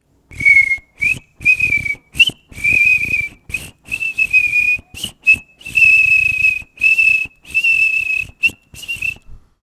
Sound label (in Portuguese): assobiando como a batuíra; passarinho da beira do mar; Pia o pio… Os pássaros e as árvores que fazem parte da vida do Martim